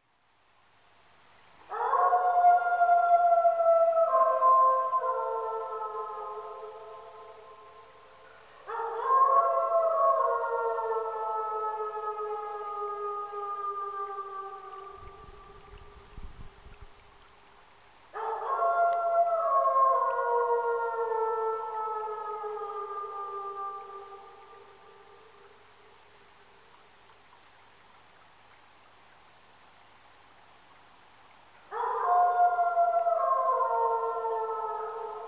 grey wolf adult male, algonquin provincial park canada; tom cosburn